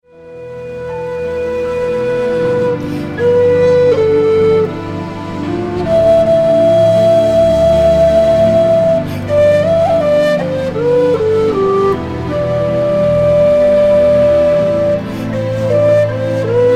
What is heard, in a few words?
celtic breeze